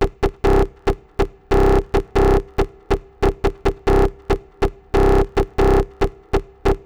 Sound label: Trance Bassloop 140bpm, Bass Arps